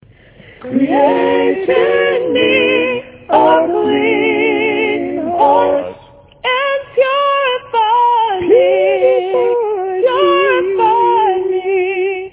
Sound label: SATB